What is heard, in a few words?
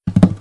Checkbox Turned On; RimWorld Biotech; RimThemes; Hunt For Me